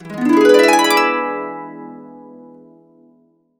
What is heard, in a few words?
E, Harps